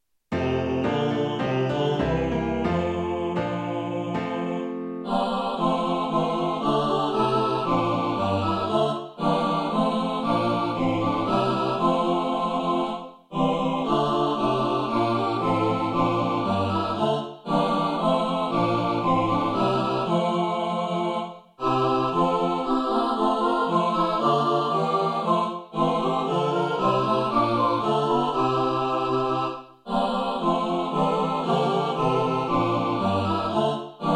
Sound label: satb only